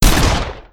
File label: gunshot spread; gfuel